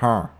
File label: grunt